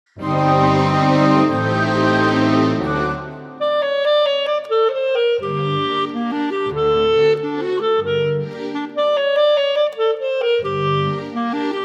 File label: Clarinet Performance